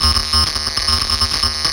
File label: Structured Rhythm, IDM Rhythms